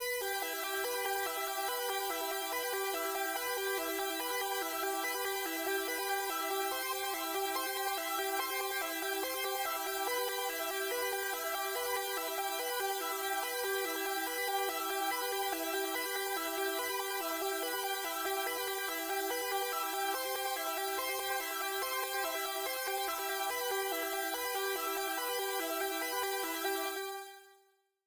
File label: 143 BPM E Min Lead; Melody Loops; Alarma; Cymatics; ANALOG EVOLUTION Beta; Cyber Collection